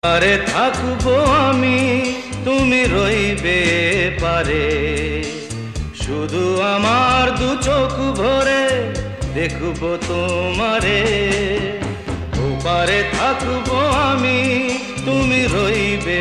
Bengali

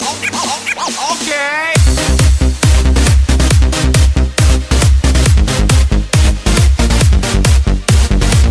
Disco

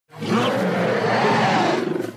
Carnotaurus roar

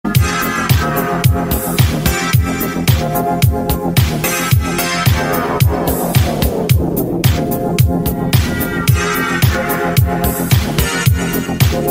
Knock; Hotline Miami

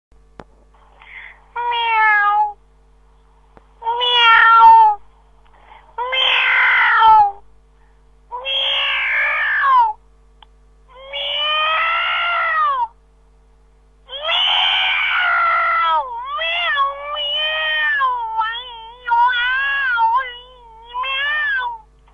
Miauuuuuuuu

